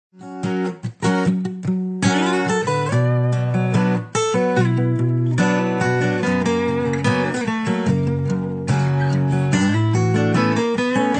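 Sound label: piano ringtone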